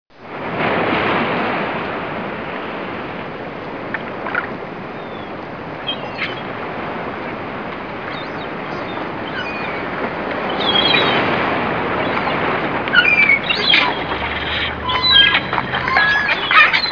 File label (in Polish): morze